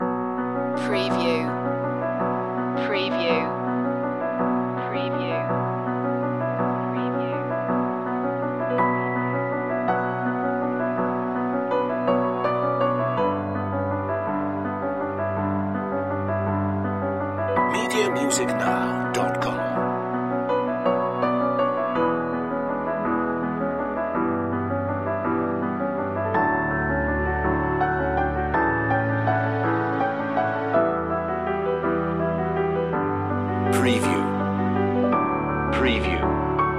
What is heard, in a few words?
waltz